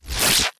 imp bullet energy bubbleshield; Titanfall 2

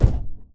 Footstep Jog Far; Titanfall 2

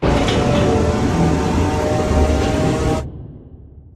Large Concrete Wall Start; Titanfall 2; Boomtown

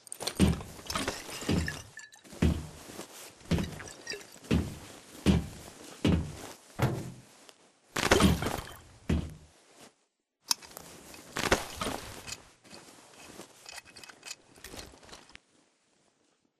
sit; Commander; barker; Titanfall 2

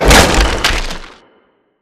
Assembly Plate Lift Impact Rock Close; Boomtown; Titanfall 2

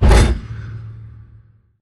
pod door ext verb open start; Titanfall 2

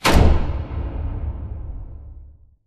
sliding door lg verb open stop; beacon; Titanfall 2

